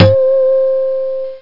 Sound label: high velocity, guitar; OctaMED SoundStudio V1; jazzies samples